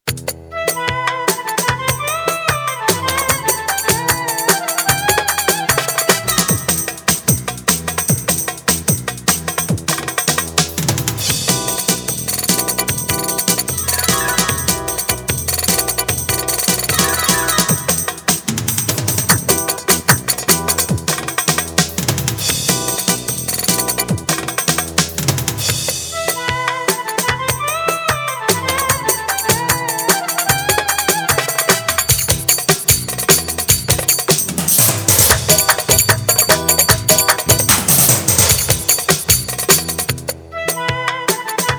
oriyental ritim, roland; oryantal

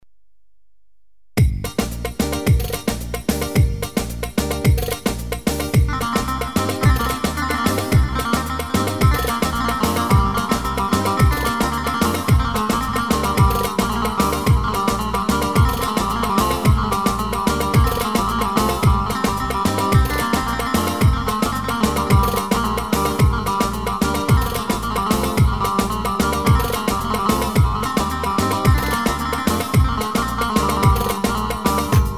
2-4 yeniler, elektro ve 2-4 ritim sx3000

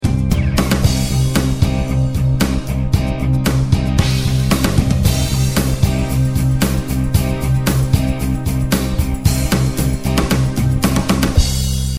bk-7, roland bk-7m ritim modul

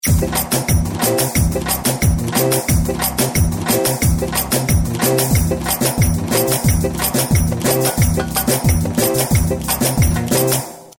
kesanli ritim denemeleri, gw8 ritim

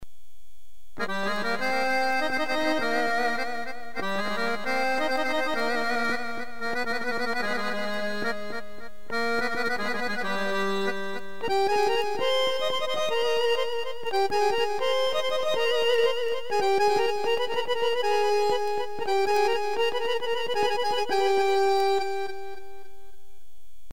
akordion azeri

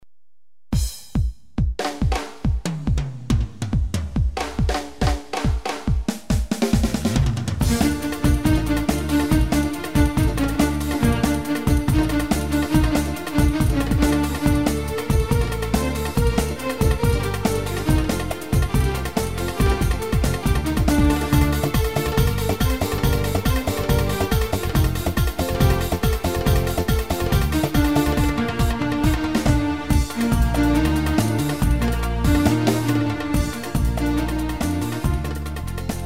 duble, SX 3000

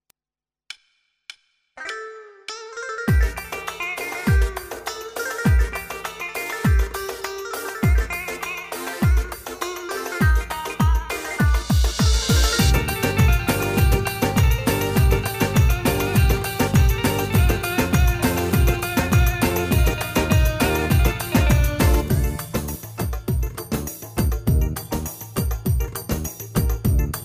2/4, OR700TR V2 ritim

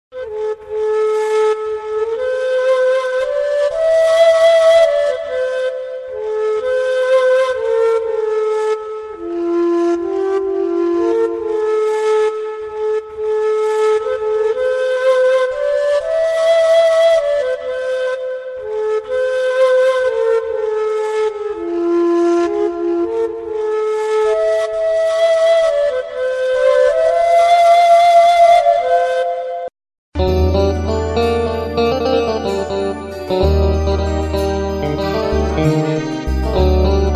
gw8 ney kabak kemane e gitar; nostalji

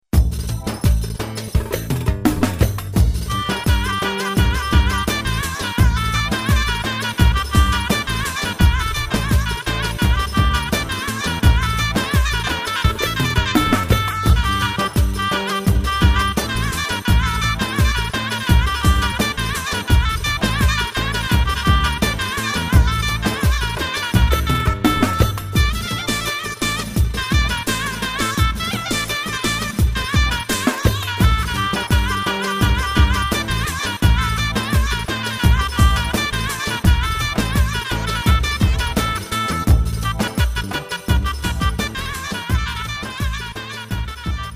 loop ciftetelli pa1x, korg

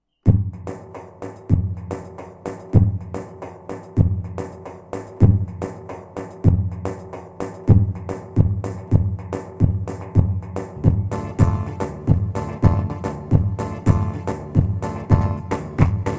pa 500 tr giresun karsilama, korg; tr karisik ritimler

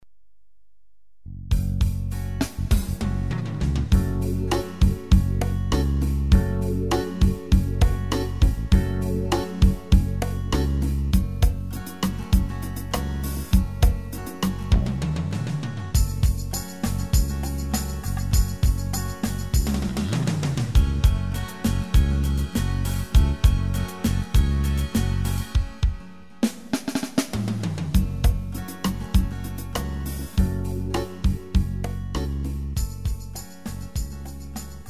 SX3000 ritim

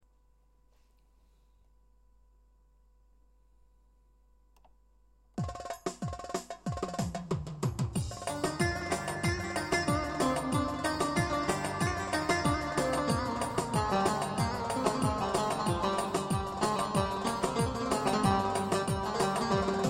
Korg PA 55 TR 4 telli; ses ve ritim demolari

